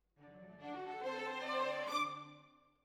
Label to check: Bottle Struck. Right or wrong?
Wrong